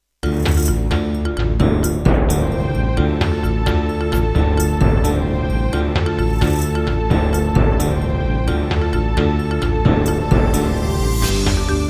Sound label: Roland SonicCell GM2 TEMPLATE, wavetable; Heretic